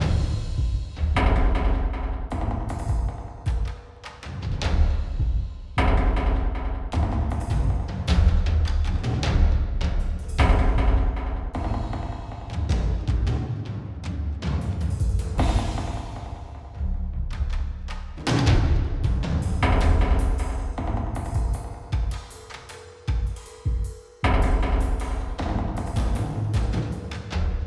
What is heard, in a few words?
Fight Drums; We Happy Few